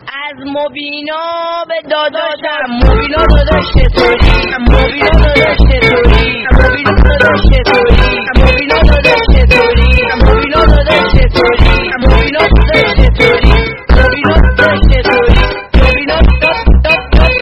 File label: Iranian funk